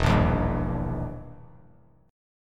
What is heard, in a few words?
E Minor Major 7th Flat 5th, E, down; EmM7b5 strummed